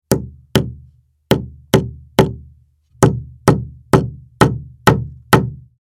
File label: Hammer hitting wood panel hammering